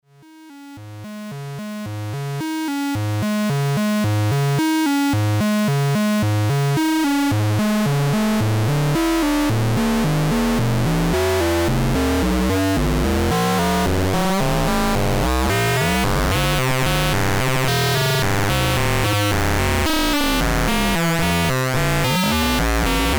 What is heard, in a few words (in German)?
ACL Variable Sync VCO FM durch Sägezahn von VCO2 verschiedene Tonlagen